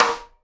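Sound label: SIDE STICK, Live Snares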